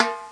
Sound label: pan; My Science Fair